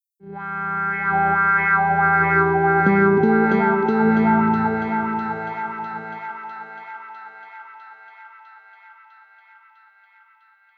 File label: Delay Guitar